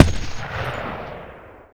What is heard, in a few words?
speeder gun end far